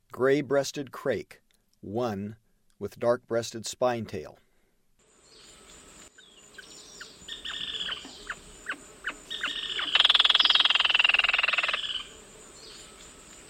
Gray breasted Crake; S. America

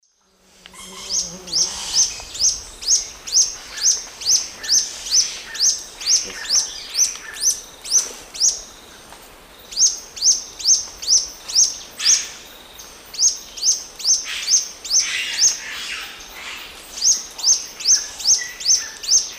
Epinecrophylla erythrura, song; S. America; Eastern Lowlands

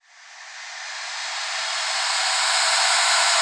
Crescendo FX; Dance; SFX

